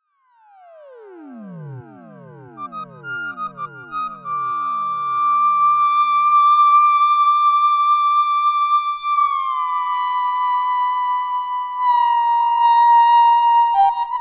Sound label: Lead